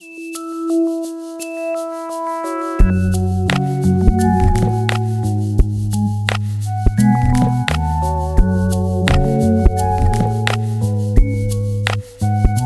Beat

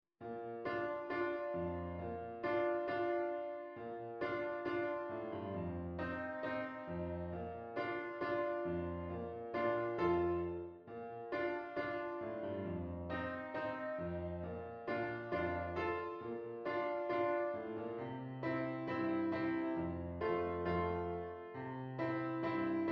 Piano

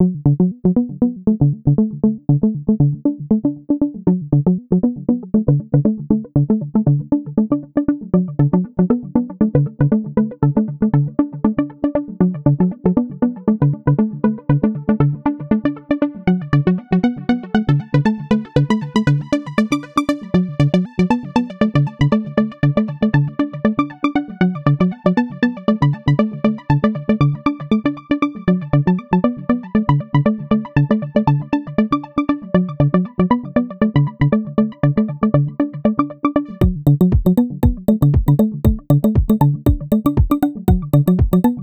Electronic

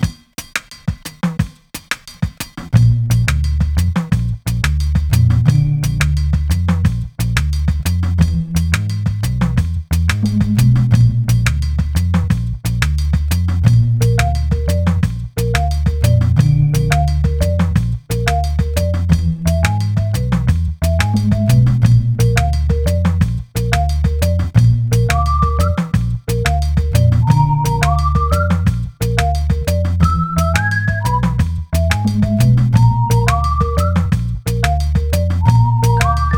Whistle Work; Joyful

